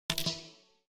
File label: Connect; Nokia N85; Audio themes